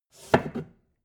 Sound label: take book